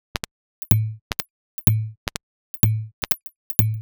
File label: Top A 125bpm, House Drumloops; 90 s Sample CDs; Zero-G House Fabrik Drumloops; ZG Drumloops